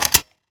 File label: rifle Reload; WEAPON